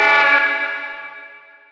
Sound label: ahh Vox